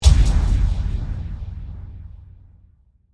Explosion Impact, Scifi, Explosion, Impact, Synthesized, Cannon, Science, Fiction; Battle